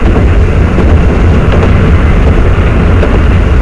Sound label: run, Train; OpenBVE